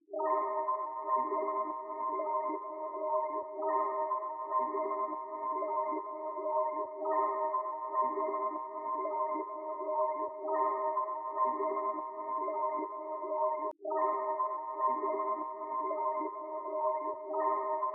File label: Texture, 140 BPM C Min, Ambient; Melodies; Loop Stems; Cymatics; GHOST (Beta Pack); Boulder